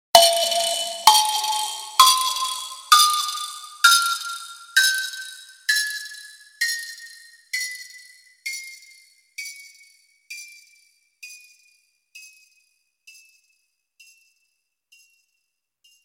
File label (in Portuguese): caixa; ohm force